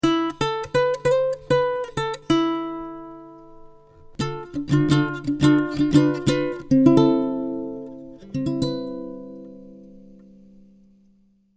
son Guitare Yamaha; Balalaika

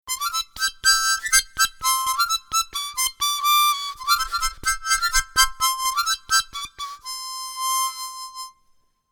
son harmo mini

